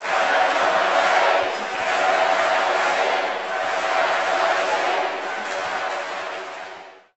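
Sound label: Basketball Crowd Chant; Crowds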